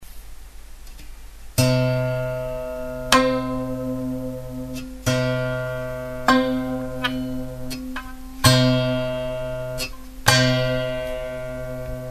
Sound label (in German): oktave, musik